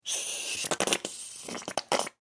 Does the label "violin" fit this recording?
No